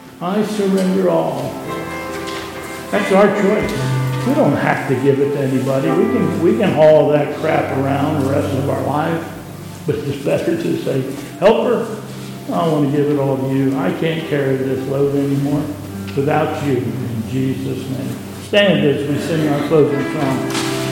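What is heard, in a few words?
Hymn, church service; I Surrender All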